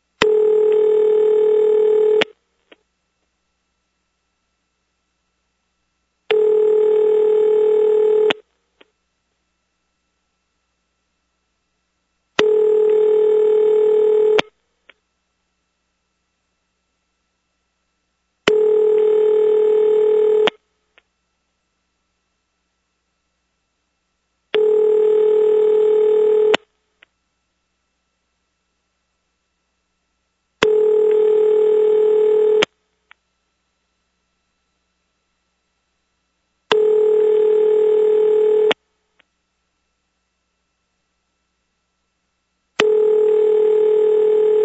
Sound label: Ring, Stromberg Carlson X-Y Switch; sounds from preserved step by step switches; 462 Prefix; Phil McCarter